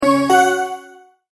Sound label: multimedia tone verified; Tones; Sound Design; McKinney Sound